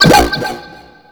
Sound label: Bomb Ping; Warframe; Missions; Sentient Defend